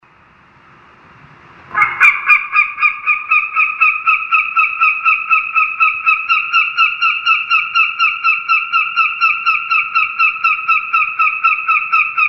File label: HAWK SCREAM